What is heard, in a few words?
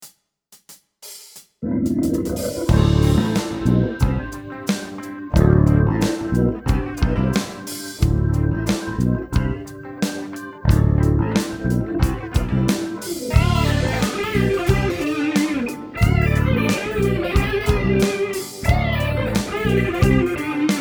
break with bass